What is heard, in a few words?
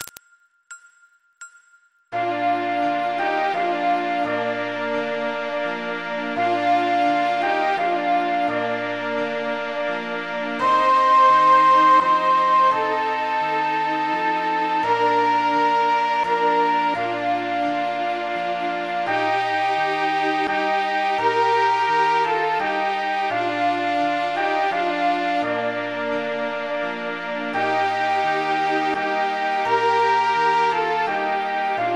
STILLE NACHT MIDI